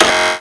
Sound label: denied; hl1mp; minophor